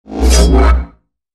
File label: saber Sound